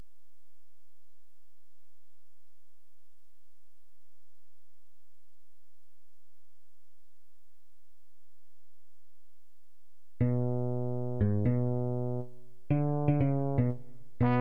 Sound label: bass and trumpet